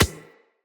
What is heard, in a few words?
Beach Ball Bounce Dirt